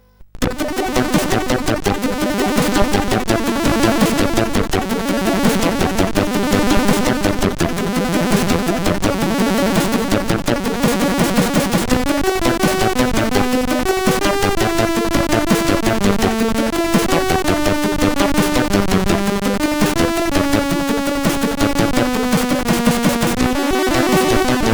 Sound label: Intro Tune, sid CSG8580R5; Intro-Tune for Talent